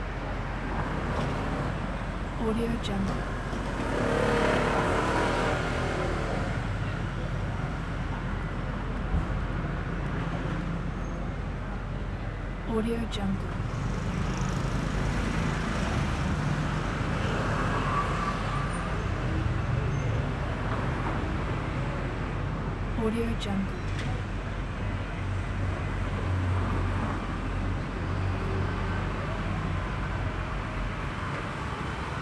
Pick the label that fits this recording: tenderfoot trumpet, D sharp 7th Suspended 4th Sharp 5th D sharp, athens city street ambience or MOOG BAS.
athens city street ambience